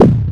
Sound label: Dunk, Drums, Industrial; Sonic Foundry (Sony Creative Software)